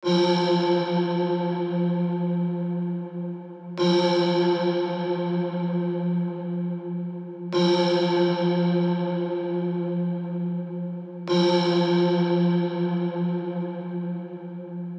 Vocal Ambience - 128 BPM F; Vocal FX; Cymatics; Euphoria Vocal Sample Pack

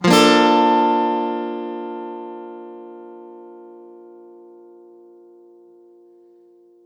Guitar 128 BPM Amaj; One-Shots; Ghosthack Advent Calendar 2021 - Day 10; Guitar Loops and One-Shots

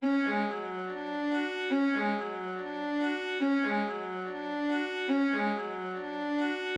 142 BPM F# Min Violin; Loop Stems; Cymatics; Cymatics Gems Vol 7; Projectile

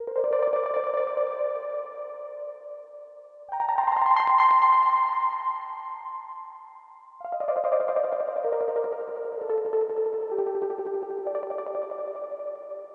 128 BPM G Min Lead, Analog; Melodies; Loop Stems; Cymatics; GHOST (Beta Pack); Archangel

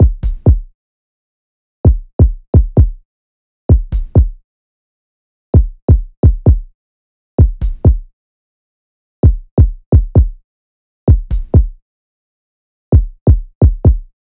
Drum Loop 130 BPM Kick; Loop Stems; Cymatics; Vibes II Drum Loop Collection; Genie Drum Loop

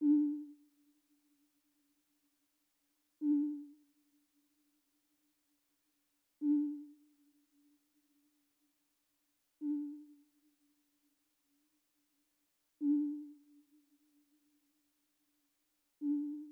150 BPM C Min Accent Low, melody loop stem; Cymatics - 2022 Melody Collection - Wavy; Ganja

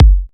Kick, House Drum One-Shots; Ghosthack; Advent Calendar; House Kit

